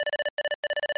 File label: Beep